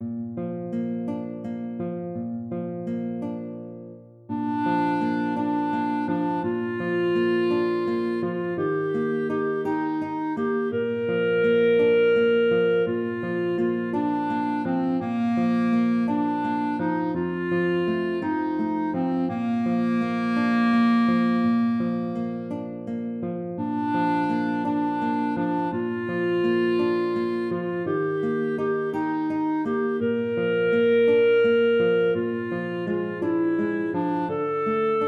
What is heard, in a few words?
oholynight clarinet guitar